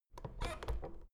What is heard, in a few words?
door plastic handle pull down creak soft